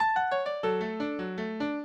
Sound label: minuet, mozart